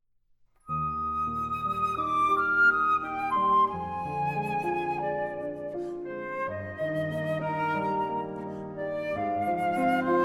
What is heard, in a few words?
Clarinet Sonata In E Flat Major, Allegro amabile